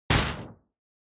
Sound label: Motion Slam Dry